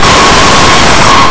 faucet

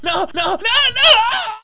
noaaargh

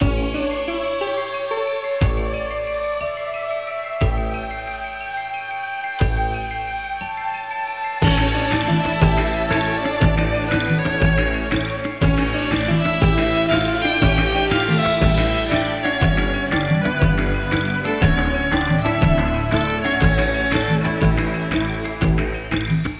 Oriental Sound; Macworld (1998-05); ClickWorks Delight; Media for Lesson 1

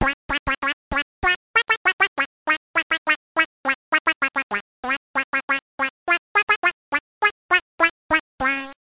duck; My Science Fair

